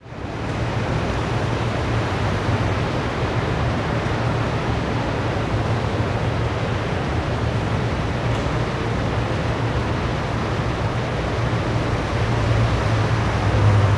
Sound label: Tokyo Amb